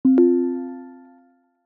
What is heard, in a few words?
RESOLVED